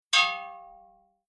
Industrial Metal Hit Drum Hollow Impact High Ring Out Single Cartoon; Tom Hutchings